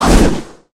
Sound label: swing; Criminality